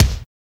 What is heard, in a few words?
COMP KICK; Drums In Your FACE!